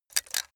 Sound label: ratch rattle